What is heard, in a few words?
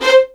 C5, LOUD VIOLINS; Q Up Arts; Denny Jaeger Master Violin Library